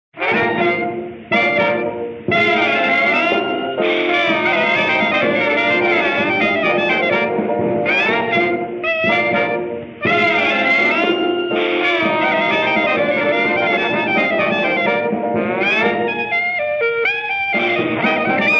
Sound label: dixieland; de los origenes al hot jazz